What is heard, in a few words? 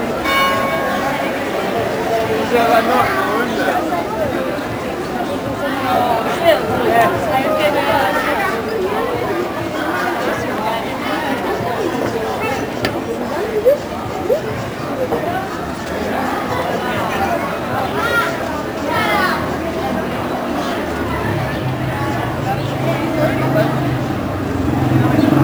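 Gibraltan street cafe, exterior; ENV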